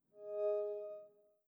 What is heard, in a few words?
map open; radar